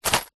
purse pouch leather containing coins and notes single shake; foley